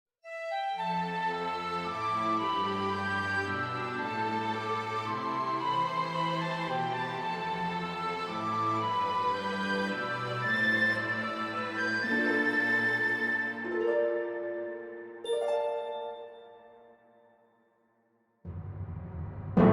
Orchestral Arrange

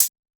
Closed HH, PsyTrance; Cymbal

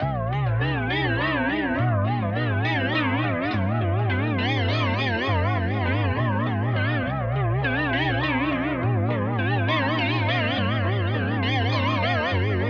concussion type beat

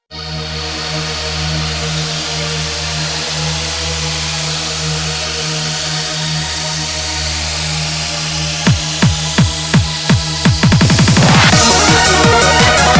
instrumental

